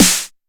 TR909 Snr, drum machines; Roland SH-32; Drumset 03

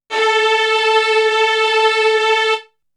Strings; ZERO G - Datafile DISC 3

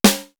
CLASSIC, TOM; East West - Global Sounds; D4 BD SD TOM

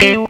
FUNKY GTR; E-MU Formula 4000 Series Vol. 1; Hip Hop Nation; Funky GtrLicks

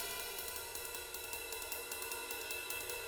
310 BEBOP, Living Drums; Sampleheads; Peter Erskine

